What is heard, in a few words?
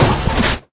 hit vehicle